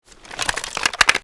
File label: ak47 inspect magbump; Tacz1.1.5+; RSWS 1903